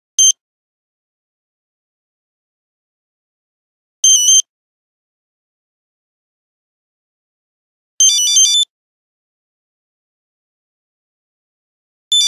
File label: 8310, Nokia Buzzer; Attraction; Windows Phone apps